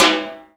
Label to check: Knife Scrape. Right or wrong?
Wrong